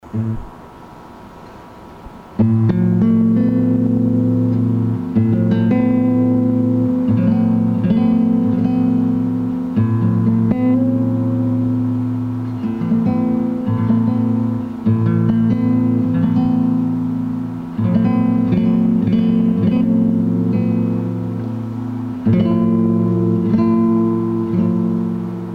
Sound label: Guitar